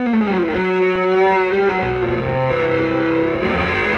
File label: Snakey Up, Electric Guitar; Sonic Foundry (Sony Creative Software); Friendly Fire; Serpent